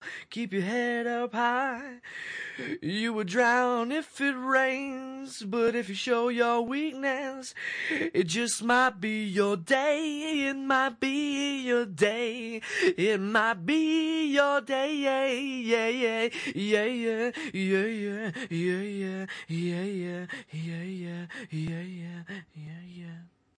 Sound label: male vocal, 180 bpm male acapella; vocal acapellas